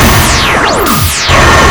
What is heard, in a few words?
reactor; quake2; redux